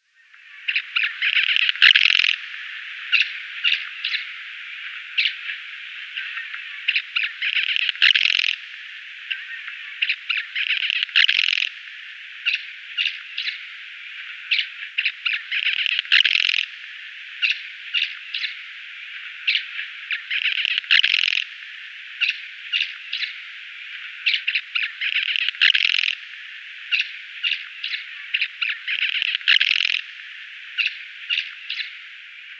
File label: Rock Martin song